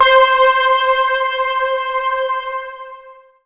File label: SYN O1W; Best Service Gigapack 1; SYN DIG3